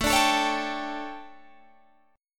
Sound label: D Major 7th Suspended 4th, chord